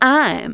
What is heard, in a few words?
vox, Techno Trance; Ueberschall